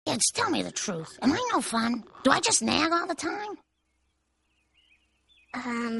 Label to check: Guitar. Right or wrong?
Wrong